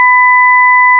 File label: 0V5 1K2KHZ